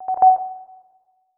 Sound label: beep